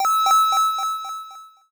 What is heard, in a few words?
Plunder Victory